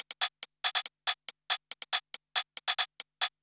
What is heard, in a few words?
Hi Hat Loop, Dance, Beats